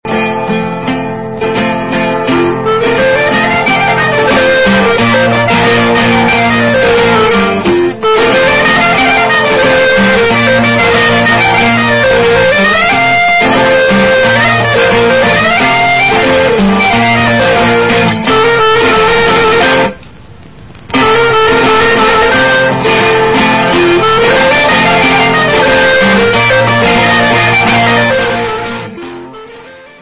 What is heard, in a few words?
Syrtos